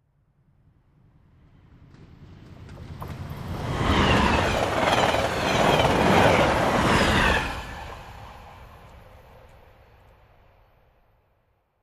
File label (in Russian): poezda